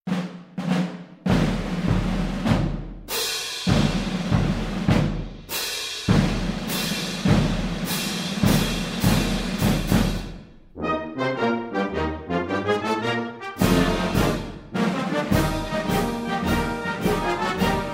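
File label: stroevaya muzyka dlya marshyrovki, drill music for marching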